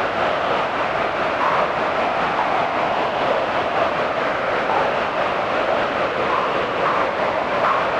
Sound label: crowd, ambience; vellum